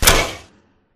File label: Fence Flip Stop; Titanfall 2; Boomtown